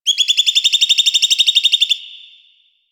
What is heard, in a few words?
Drifter Camp Valley Tree Critter; Ambience; One Offs; Warframe; Quests; The New War